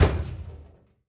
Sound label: Gear Lock Down, Aircrafts; DCS